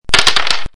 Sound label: dice roll